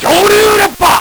LSDj, Nintendo Gameboy; 300 Drum Machines

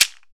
PRC WOLOF, Afro Shakers

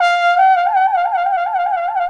TROM DOIKS

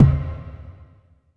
DM5 Perc, Alesis DM5 drum machine

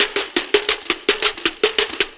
Scraper, Percussion Loops; Ambient Grooves

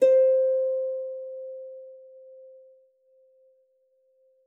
B4, HARP PIANO; Xsample VOL-8

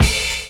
BD; Best Service; Dance Mega Drums

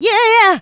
Vocals, Adlibs; Houseworx

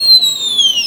VIO MISC, MISC VIOLINS

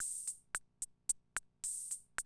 CR78, DrumLoops

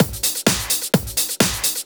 Clubhouse Loop 128 BPM

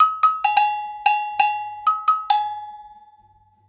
130 AGOGO, Percussion Loops; USB Soundscan vol.36; AKAI

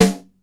XE8 SNR, drum; Crypton - DrumBase5000

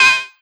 SYN, Drum & Bass, 160 BPM

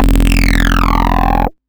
Motostart, FX Atmospheres; Zero-G; Soundclash Nu Dance Electro; Acidized Wavs

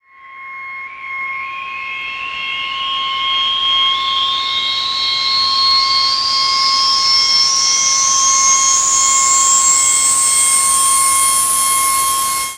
fx reverse; Ueberschall; Techno Trance Essentials

